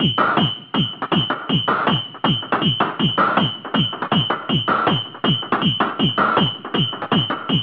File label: whine beat; Zero G; Malice In Wonderland